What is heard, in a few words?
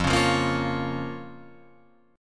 F Suspended 4th Sharp 5th F, Guitar Open F; Fsus4#5; strummed